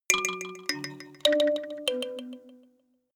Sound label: notification kalimba delay sequence minor